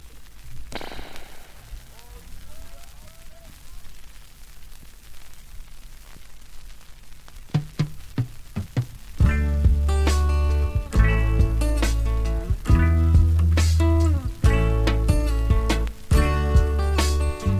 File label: talking blues